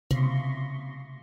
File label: alert sound